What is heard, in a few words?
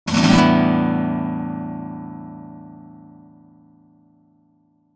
rasg hard pluck synthesis, rasgueado